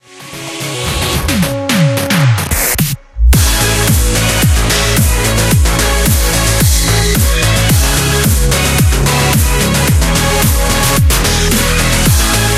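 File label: background music crossfade; Invaxion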